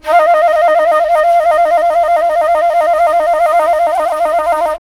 flute, D brass licks

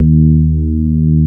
DX PIANO; Wizoo - Powered DX

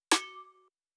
Snare F

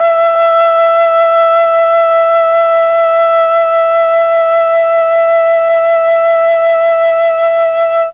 E3 TRON FLUTE, Mellotron; Mike Pinder - Mellotron Archive CD

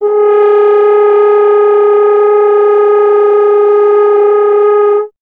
F. Horn; Miroslav Vitous; Solo Instruments VOL-2

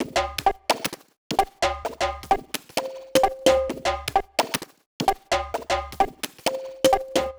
Cn-Fx Loop 130, Perc Loops 130, Loungin House; Equipped Music

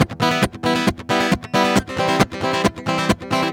MERENGUE, World Grooves

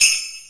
SLEIGHBELL; THE JAZZ

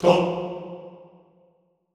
TONG F2, Classical Choir; Best Service; Extended Classical Choir; SHORT TONG

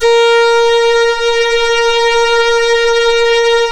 Synclav FM STRINGS A#; Big Fish Audio

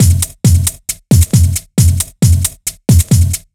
March Break, Grime Scene beats; Zero-G Sound Sense Grime Scene

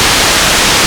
ARP2600, modular syn

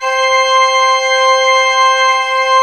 C4, Cathedral Organ; ILIO; Propeller Islands Cathedral Organ

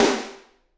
SD, Drums; Bob Clearmountain Drums I

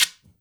GUIRO; InVision Interactive; Percussion Slam

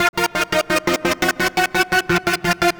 Seq Spooky Mel Lo, Drum & Bass; Sonic Foundry (Sony Creative Software); Knutrix Drum & Bass

